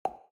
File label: combo